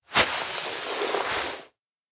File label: slide on moss kigurumi; anemoi